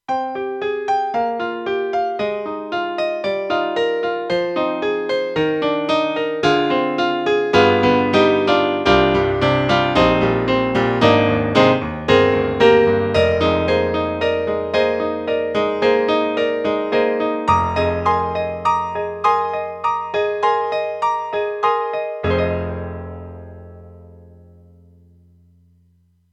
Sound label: AKAI SG01k Pf